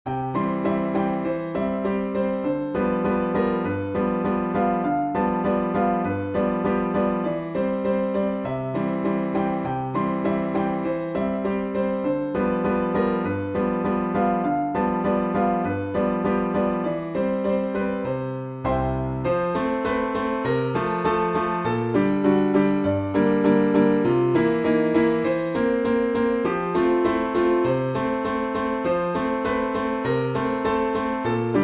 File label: PIANO